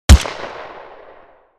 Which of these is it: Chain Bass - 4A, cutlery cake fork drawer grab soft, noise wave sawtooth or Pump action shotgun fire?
Pump action shotgun fire